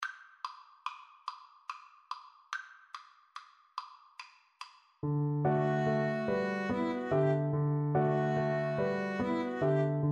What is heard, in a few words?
violin cello, Violin-Cello Duet; lakme